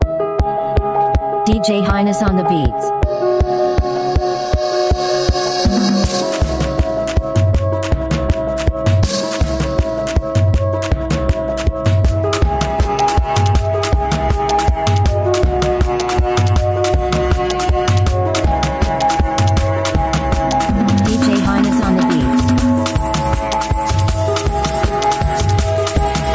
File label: Soft Emotional Mara